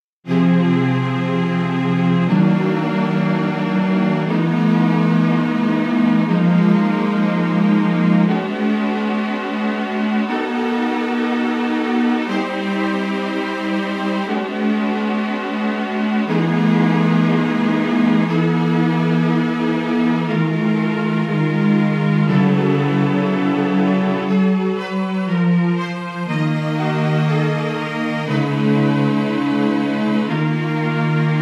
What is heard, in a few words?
strings